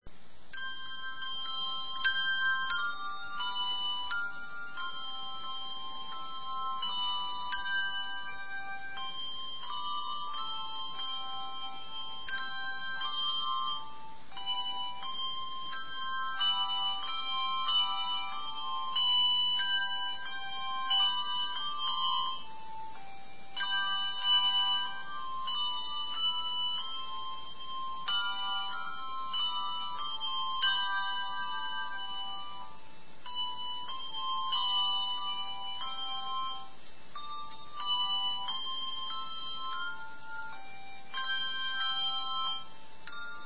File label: Bell Choir; You Servants Of God